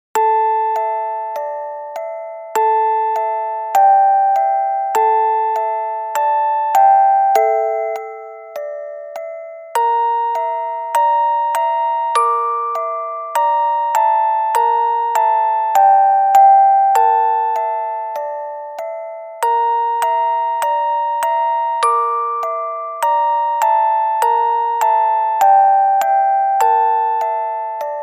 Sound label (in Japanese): とおりゃんせ オルゴール